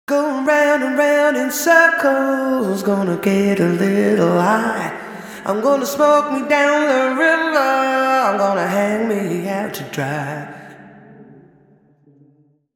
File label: vocal ir